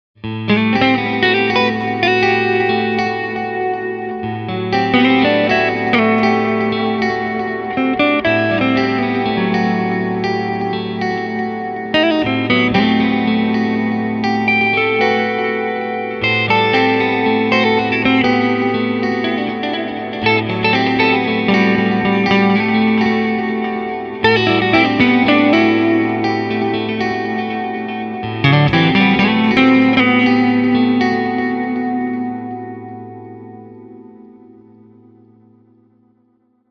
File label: Ribbon Guits